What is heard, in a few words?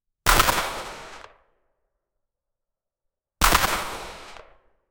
sawed off shotgun